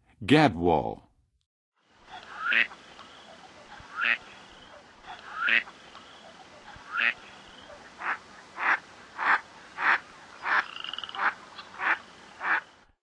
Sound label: Gadwall; North America; Western USA; Western USA Songbirds